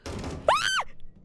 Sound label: Scream at door; IRyScream